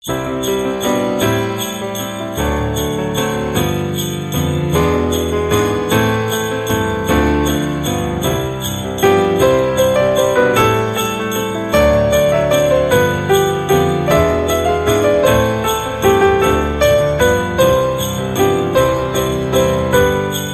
We Wish You A Merry Christmas

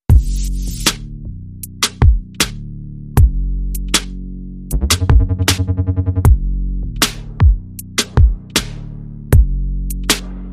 Bass and Drum

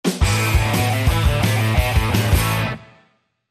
Kick Start, Sting

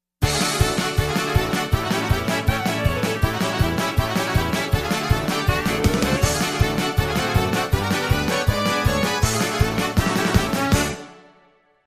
Sting

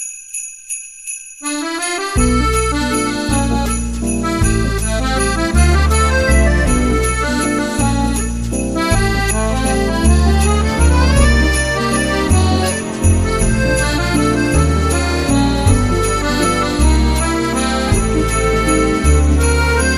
Waltz With Sleigh Bells

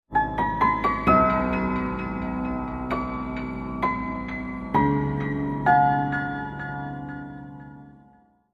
Murder Mystery Sting

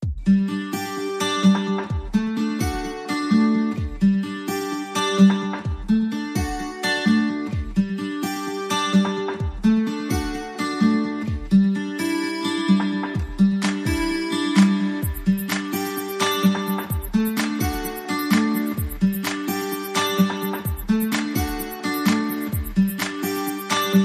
Perc and Acoustic Guitar

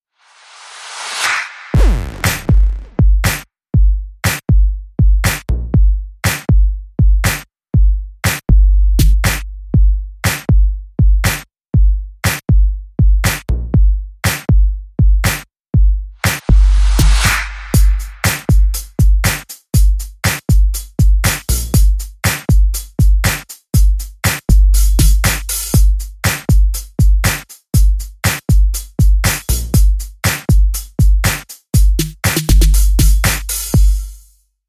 Pekman Beat

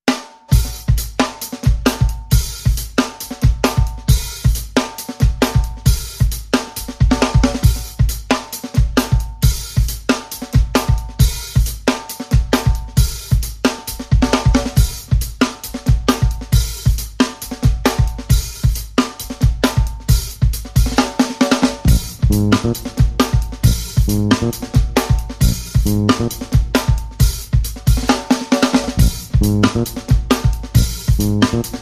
Soul Shaker, Drums and Bass Only

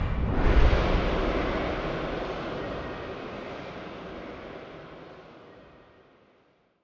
Low Boom Sweep

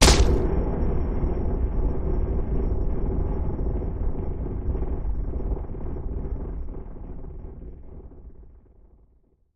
Crashy Impact And Rumble

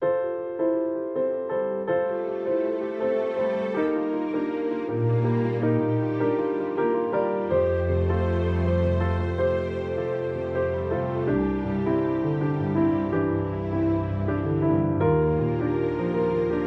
With Strings

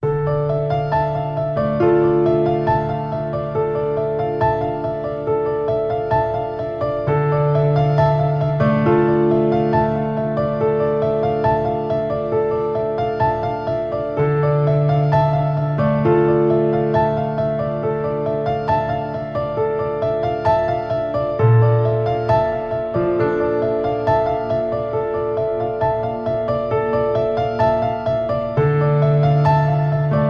Piano

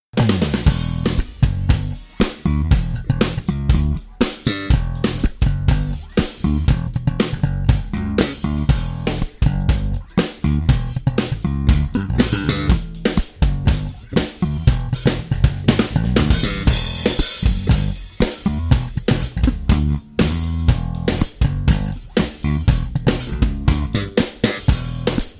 Drum & Bass

